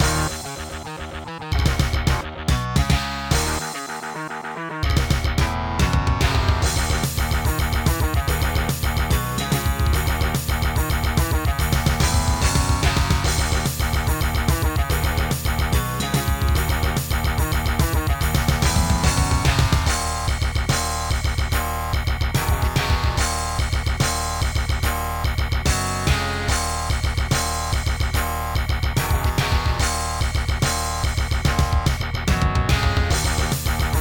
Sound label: boss, music